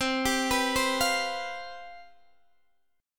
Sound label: C Major 7th Suspended 4th, C, down, Guitar, Open D